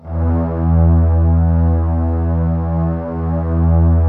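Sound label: violins, low violins; Q Up Arts - Denny Jaeger Master Violin Library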